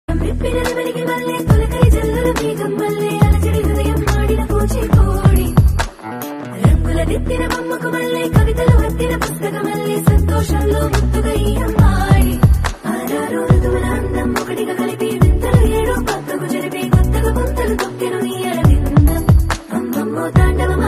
south indian love ringtone

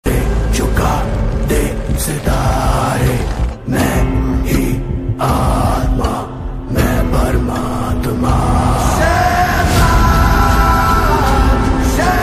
movie theme bgm; Shaithan movie; R Madhvan; Ajay Devgan

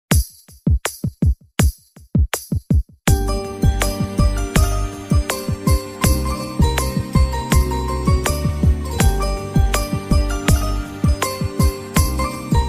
sad bgm tamil bgm ringtone

